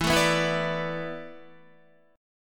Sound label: E 7th Suspended 4th, Guitar; E7sus4; strummed